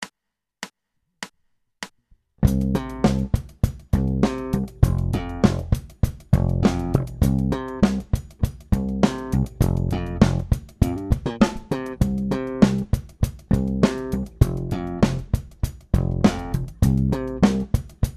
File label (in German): Slap-Grooves